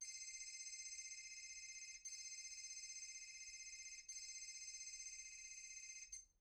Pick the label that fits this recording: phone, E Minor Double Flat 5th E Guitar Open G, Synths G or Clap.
phone